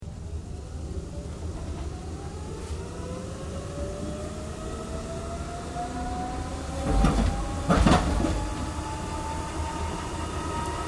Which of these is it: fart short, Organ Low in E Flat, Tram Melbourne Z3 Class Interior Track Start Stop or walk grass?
Tram Melbourne Z3 Class Interior Track Start Stop